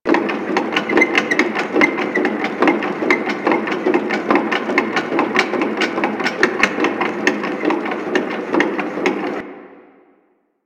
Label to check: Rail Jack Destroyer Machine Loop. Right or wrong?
Right